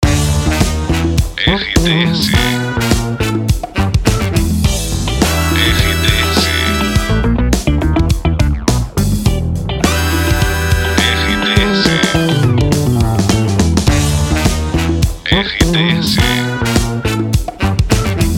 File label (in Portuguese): trilha para locução educativa; Inatel